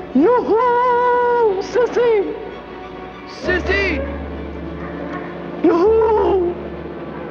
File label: YODEL, ringtones; NOEL COWARD YODEL